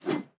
Swing Sword Short

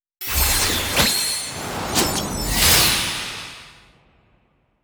Ingame Playstart You; disney star smash android ios mobile gamerip 2020

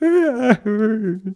Cry; Neighbours From Hell Console Edition; GC, Xbox

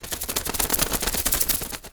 Enemy Teapot Teacup Approach

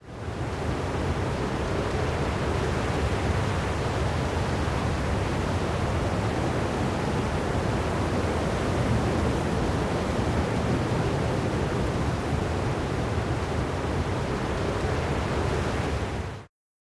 Halfd Tree wind

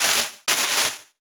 Gasa Gasa Tree; D4DJ Groovy Mix; Android, iOS, Mobile

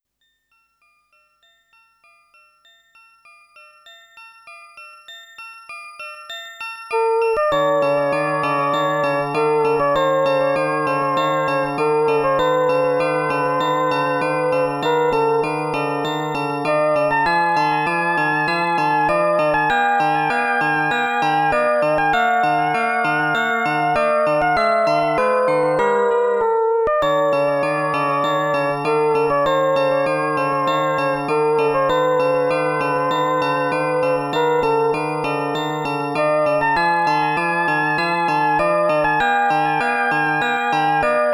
Main Menu FM Synth

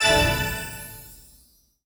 Rank Up

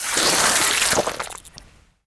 Enemy Teapot Teacup Drink

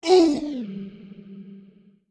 Hurt

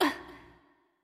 Hurt; Jackie

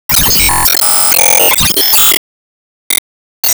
Start Transforme

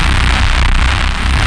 Bass; Friday Night Funkin' Soundpack V2; nasadotexe

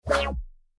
Portal; Google Snake

